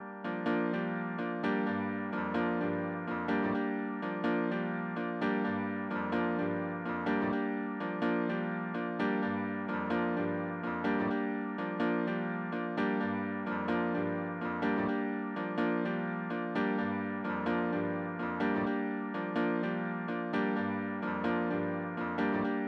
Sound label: lead